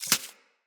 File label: Arachnoid Micro Step Snow; Enemies; Corpus